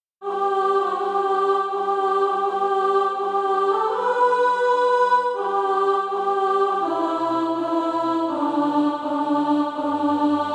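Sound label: alto, Practise Music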